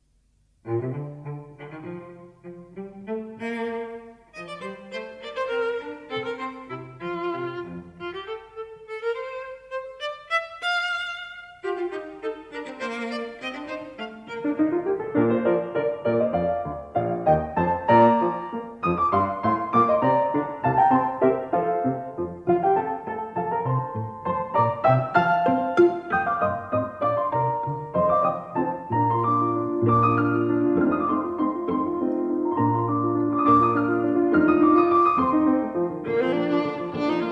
Piano Trio No. 7 in B-Flat Major

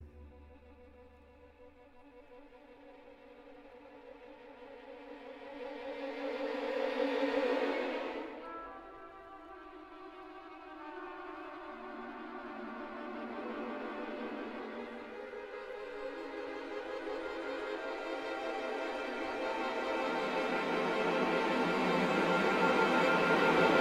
Concerto for Orch

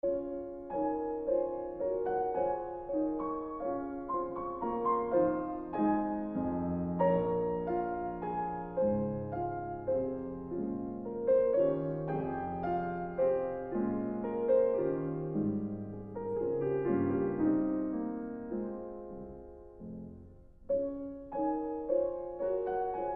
Ravel Sonatine Mouvement de Menuet

